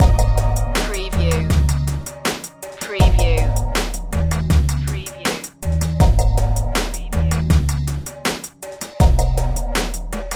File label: take me over loop